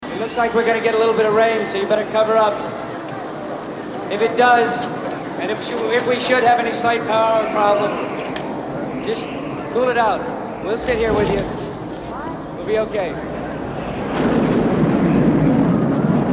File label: Rain Chant